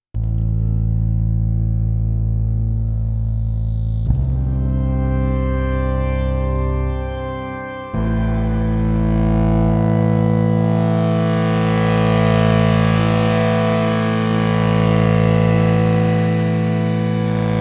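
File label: tweaking a pad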